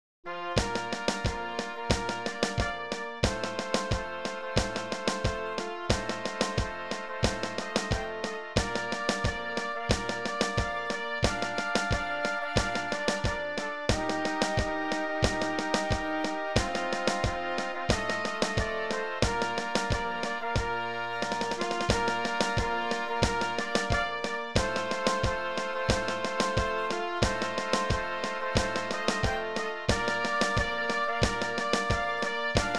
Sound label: perc